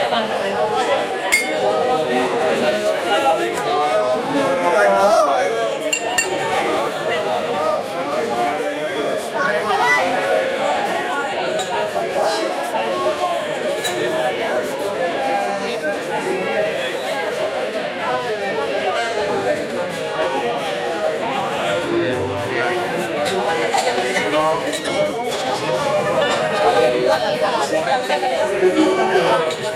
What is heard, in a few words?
ambience bar loud